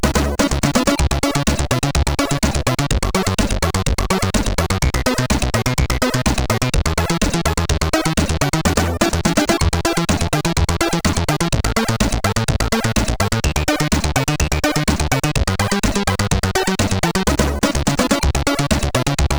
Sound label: Disco, CSG8580R5